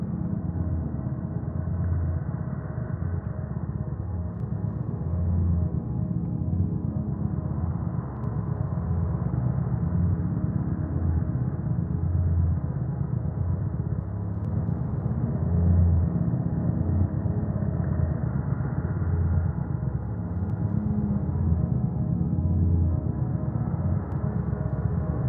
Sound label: Intro Ambient; Breached